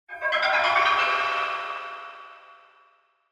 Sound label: Stinger, Trailer Instruments Designed; 344 Audio